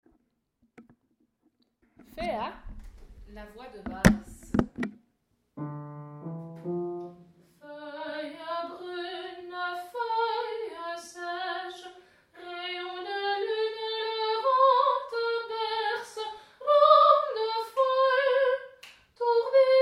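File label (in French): Phéa basse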